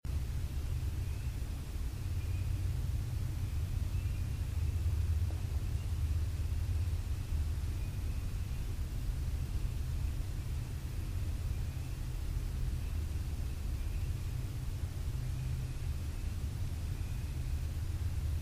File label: wood knocks; 2 Knocks at 2:33AM February 10th